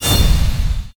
carefree melody metallic; Hollow Knight

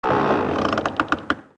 Creak

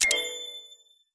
Lamp Light

